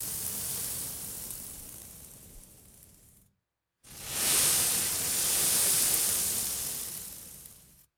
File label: light sandy dust falling and scattering